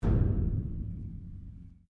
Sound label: Strike Metal Hollow Tank Soft; Materials